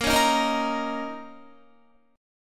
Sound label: A sharp Minor Double Flat 5th, A sharp, down, Guitar; A#mbb5 strummed